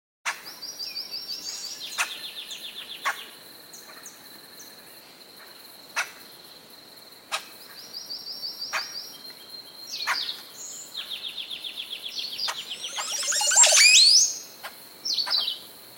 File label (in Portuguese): Canto do jacu de montezuma